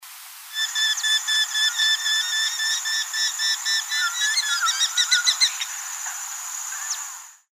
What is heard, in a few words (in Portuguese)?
Canto do Cauré